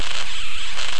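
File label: laser fly, plasma; q3rally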